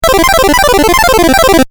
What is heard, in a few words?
8 Bit Style Arpeggio; Video Game Sound Effects